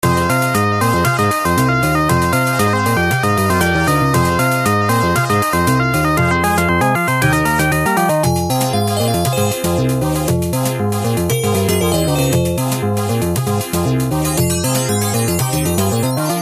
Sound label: adlib, pc; Turbo Outrun